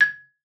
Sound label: MARF G#6, MARIMBA; Xsample VOL-4